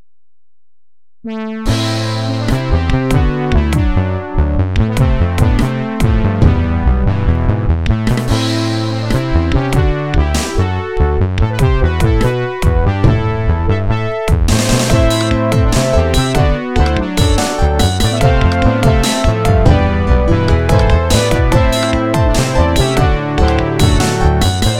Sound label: dramatic level music